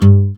GUI AC, Acoustic Slap, Roland

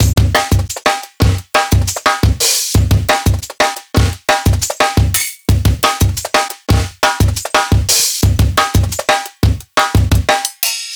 Live Jungle Drum Break, 175; JungleBreaks24

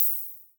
CYM, Drums; Best Service; Dance Mega Drums

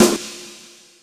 TAG SNARE, Hip Hop; E-MU Formula 4000 Series Vol. 1; Hip Hop Nation; Laser Tag 100

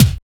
TEK THUMP K, TECHNO; Drums In Your FACE!

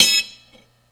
Bell Choke One-Shot, cymbal; KJ Sawka; Drum n Bass & Beyond; Drum Tool Box

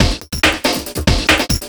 Dirtfunk, Drum N Bass; Sonic Foundry (Sony Creative Software); Knutrix Drum & Bass

